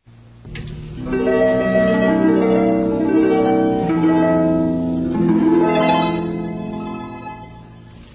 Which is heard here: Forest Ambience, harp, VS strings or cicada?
harp